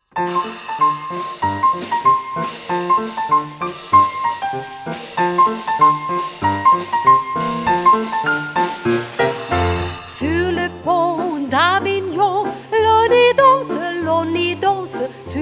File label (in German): mit Gesang; Sur le pont